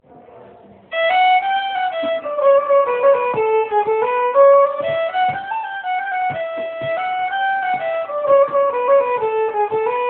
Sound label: Polska; Polska efter Ole Jansa; teaching demo; fiddleclub; scandijam